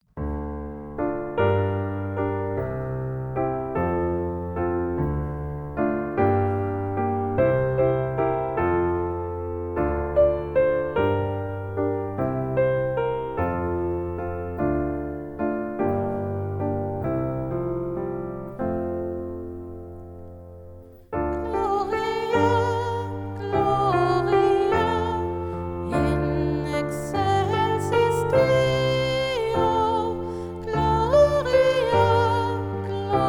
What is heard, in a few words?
Gloria Taize; Taufe 2021